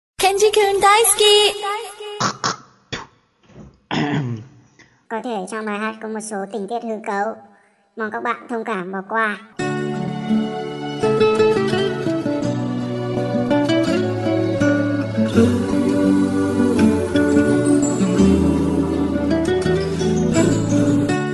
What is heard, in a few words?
rap; viet rap